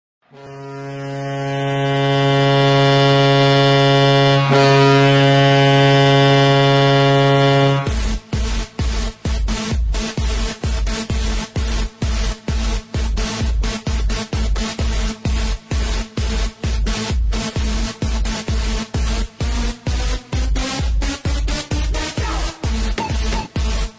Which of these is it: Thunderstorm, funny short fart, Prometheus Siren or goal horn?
goal horn